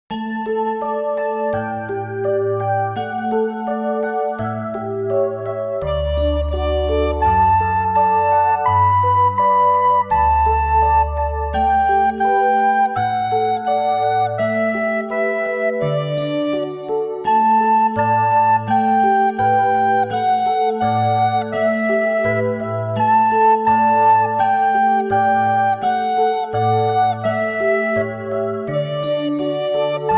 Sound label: twinkle twinkle little star full performance